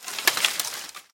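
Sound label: rustling of a big pack of chips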